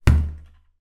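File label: household door close impact bang wooden; zapsplat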